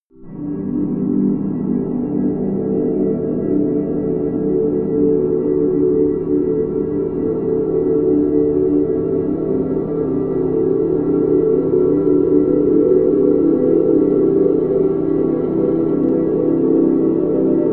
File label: wavy clouds, music elements